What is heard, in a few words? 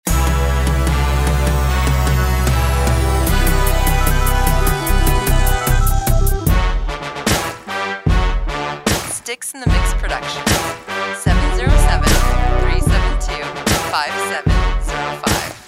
Anthem